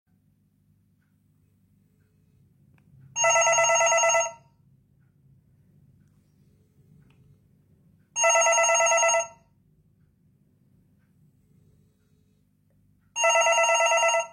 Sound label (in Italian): Telefono Sirio SIP